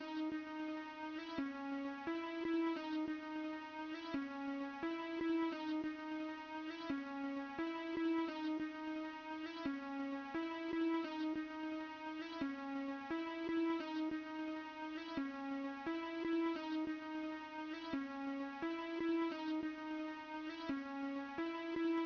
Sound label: 87 BPM C# Min Chords, melody loop stem; Trap; Loop Stems; Cymatics; Circus; 2022 Melody Collection